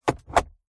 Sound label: car luxury glove box close; vehicles; zapsplat